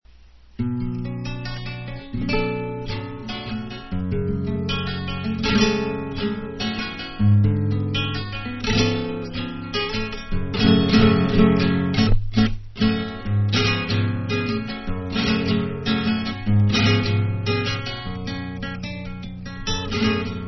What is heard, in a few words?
Rumba